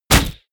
crossbow shot

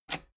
metal button robust spring push disable

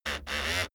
servo sequence

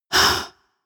inhale female

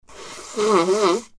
wheeze

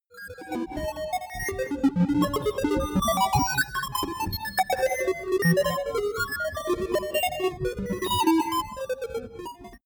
alien control room

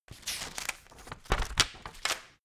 turning page

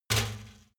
trash basket metal hit soft

